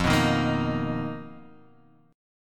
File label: B Major 7th F, chord; Bmaj7/F strummed; JGuitar